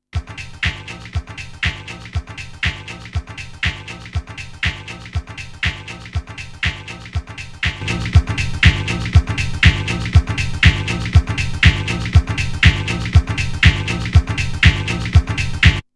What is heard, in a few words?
Dual Band Comp